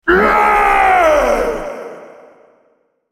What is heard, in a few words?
ultimate effort; Olaf